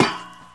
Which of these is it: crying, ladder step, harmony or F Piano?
ladder step